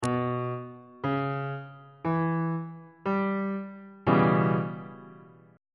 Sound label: d flat half diminished 7th 3rd inversion